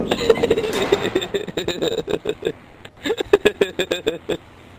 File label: laugh